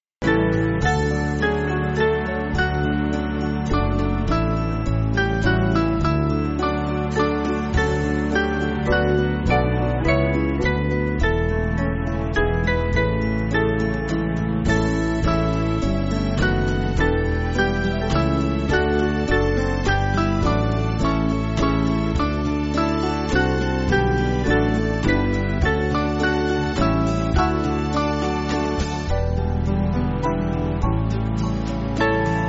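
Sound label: Band, Backing Files for Churches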